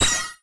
minigame countdown; MiniGames

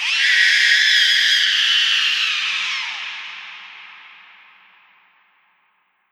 Piles Bones Horror Scream

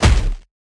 Object Drop Hit

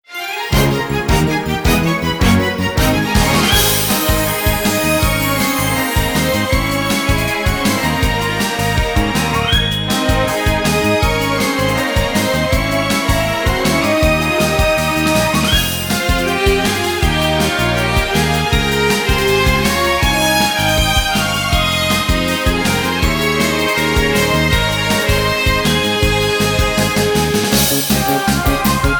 B Menu Event

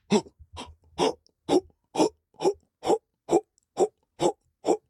Ruins Sentry Fat Patrol Loop

